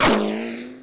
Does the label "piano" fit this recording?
No